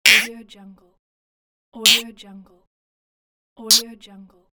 game digital jump effect; applehillstudios

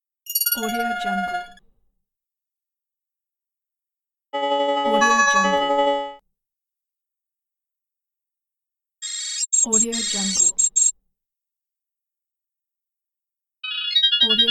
phone rings; livingroomclassic

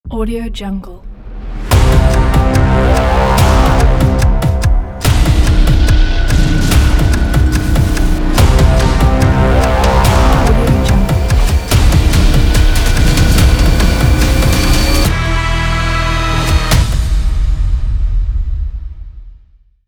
sport logo

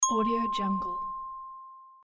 ding

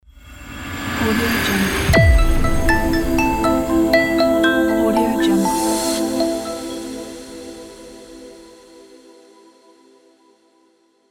opening logo

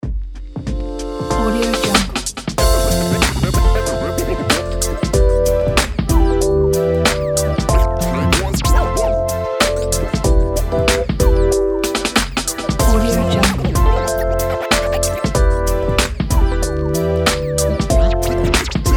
hip hop logo; vodamedia

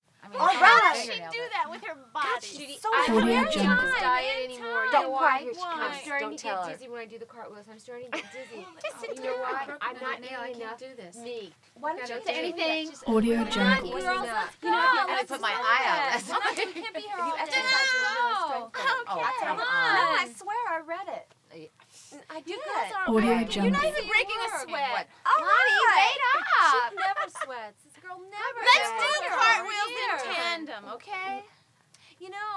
teen girls in gym constant small group

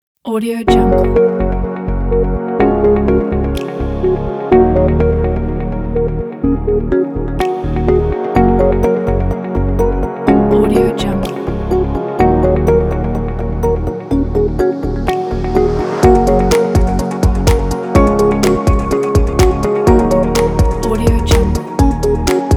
ambient atmospheric corporate background

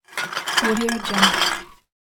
bottles empty

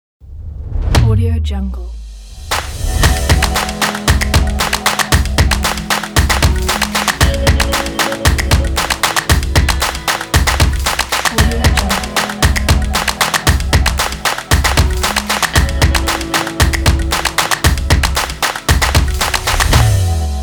clap guitar logo

